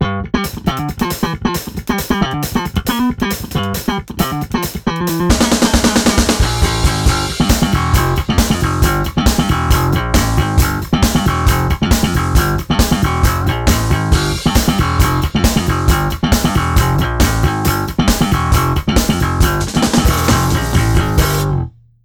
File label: slap ghost on drum on